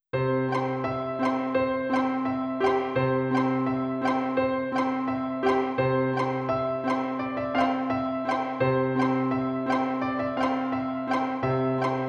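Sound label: background music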